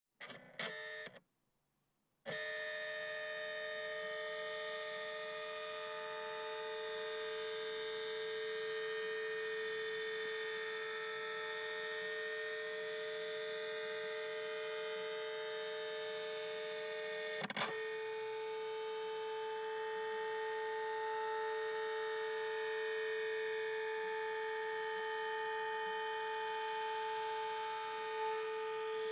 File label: scanner